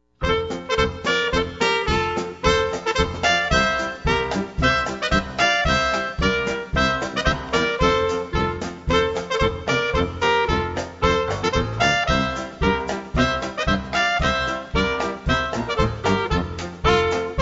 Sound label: POLKA